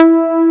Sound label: STACK BASS, Bass Guitar; AKAI S6000 CD-ROM - Volume 1